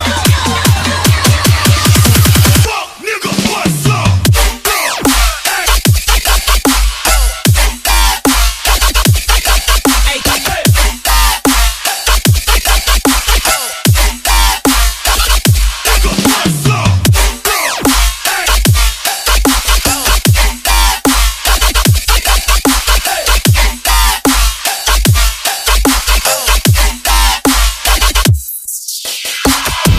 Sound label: Dubstep Alarm tone